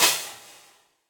Crash FX18o16 Session Dry, Cymbal; Drum Hits; Standard Hits; Session Drums Studio

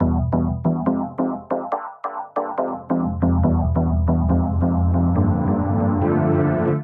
Washout D Minor 140 bpm, pads; MIDI Clips; Tonal; Cerebral; Skitter and Step; Ableton Factory Packs

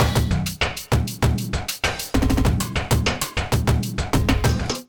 Kit groove 98 bpm; MIDI Clips; Clinical; Ableton; Drum Essentials

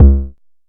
analog tonal, Kickbank; Ableton Foundation FreePacks

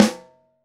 SN1 Top, Snare; Ableton Foundation FreePacks; Snare Designer

